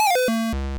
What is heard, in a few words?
8 Bit Failure Sound